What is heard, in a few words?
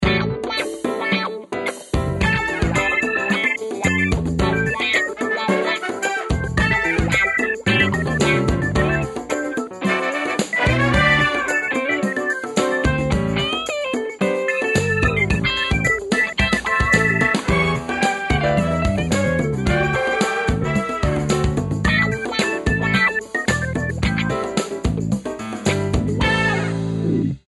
Blues Mania; LX370